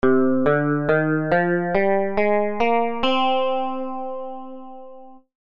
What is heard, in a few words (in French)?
mode do eolien